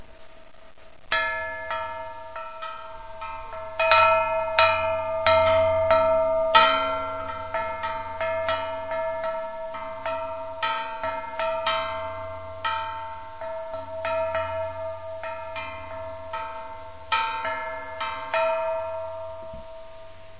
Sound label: sentinel lighthouse bell